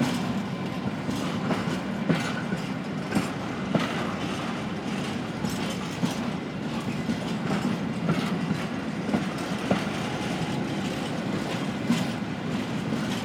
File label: train slow no damage